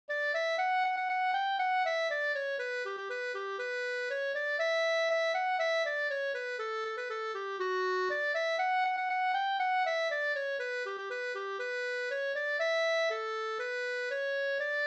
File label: polka